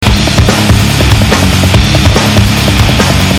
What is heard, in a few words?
depressing rock music